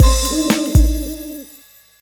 freespin init; Amatic; Magic Owl

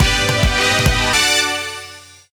win; Amatic; Fortune Girl

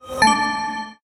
expand; Amatic; Lucky Joker 40

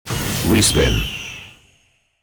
respin info; Amatic; Arising Phoenix

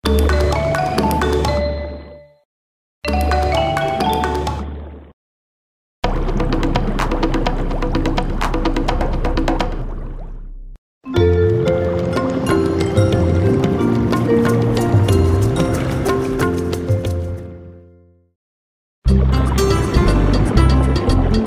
win sounds; EGT; Coral Island

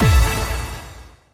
count up end; Amatic; Lucky Little Devil

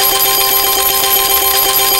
collect; Amatic; Hot Diamonds

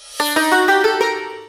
start; Amatic; Book of Pharao

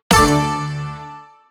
stop bonus; Amatic; Book of Pharao

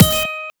stop bonus; Amatic; Fruit Box

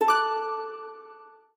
count up end; Amatic; Fortune Girl

